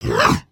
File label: Run, creatures organic